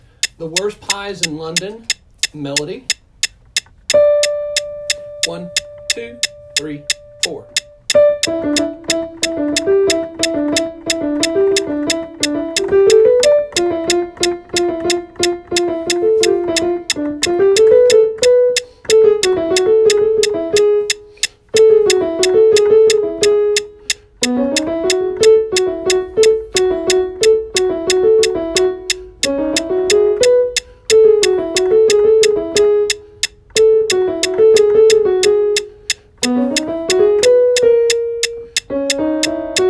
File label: melody